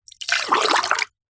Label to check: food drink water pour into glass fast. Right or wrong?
Right